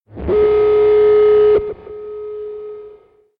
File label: start timer, ui